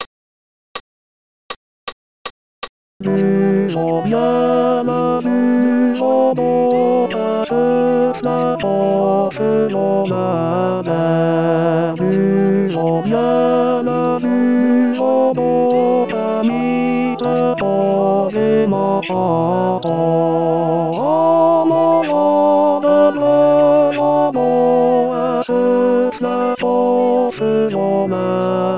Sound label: Tourdion Tenor; chorale adultes